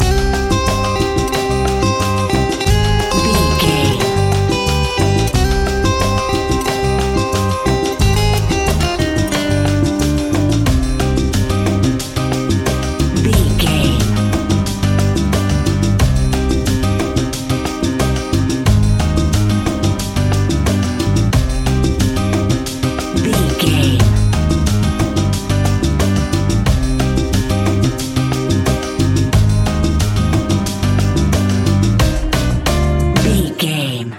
spanish latin acoustic music mix, An exotic and colorful piece of Espanic and Latin music; Spanish Latin Acoustic Music. An exotic and colorful piece of Espanic and Latin music. Great for Zumba par